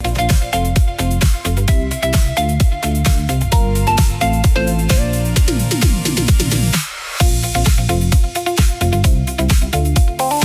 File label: loop